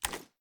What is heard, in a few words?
fast reload empty end; gmod